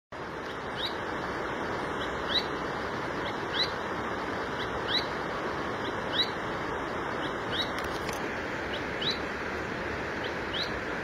Canto do joao xique xique

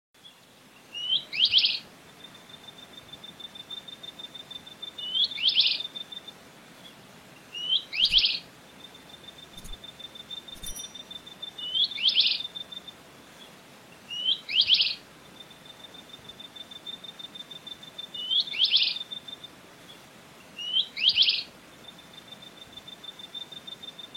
Canto da viuvinha

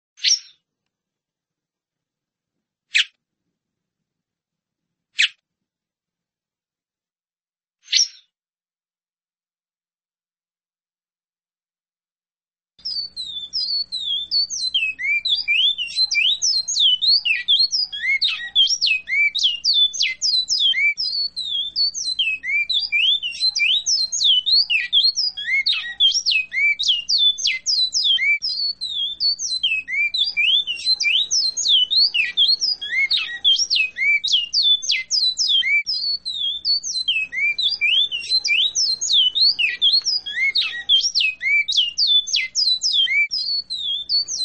Bicudo

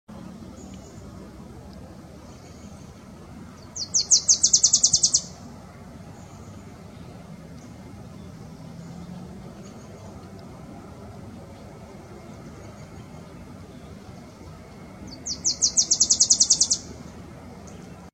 Canto do mariquita de barrete preto

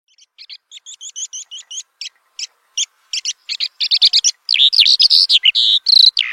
canto da calhandra real

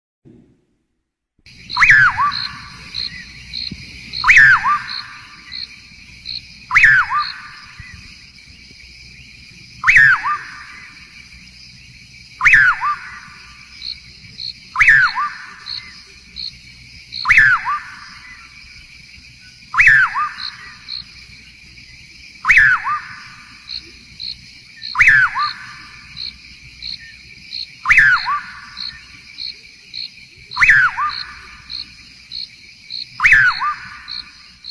canto do curiango comum

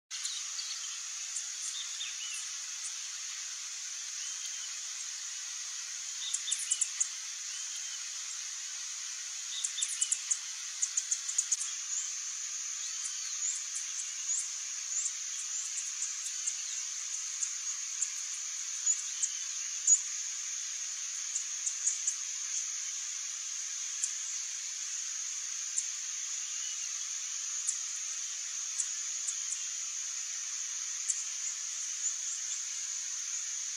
canto do saira de chapeu preto

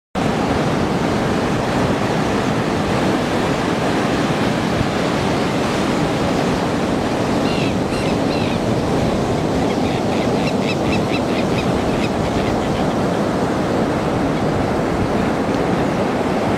canto da trinta reis de coroa branca

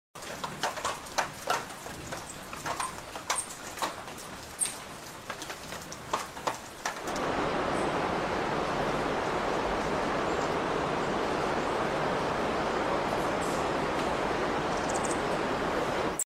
Canto do asa de sabre canela

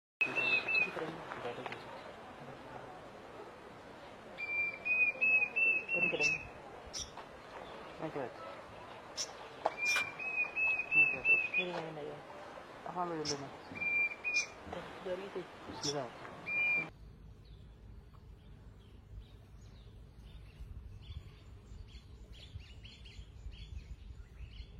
canto do cuco bronzeado

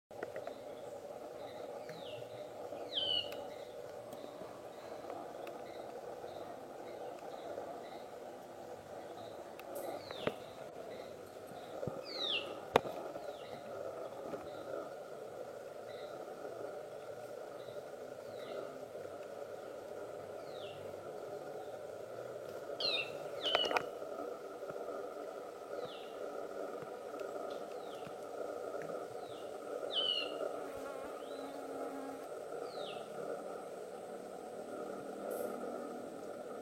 canto do uirapuru laranja

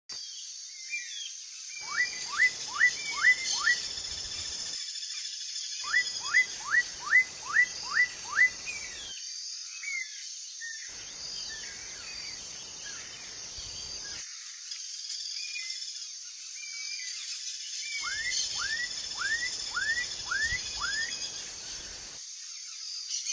Canto do japacanim

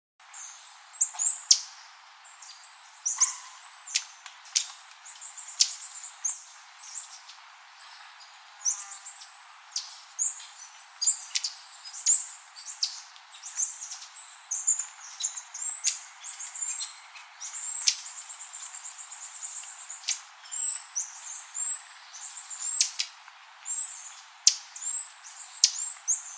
canto do sete cores da amazonia

